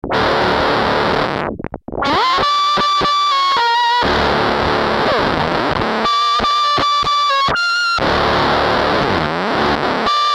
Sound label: Synth Saw Short Attack